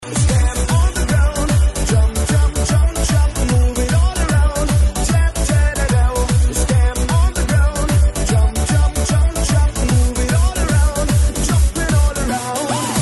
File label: win sound; pubg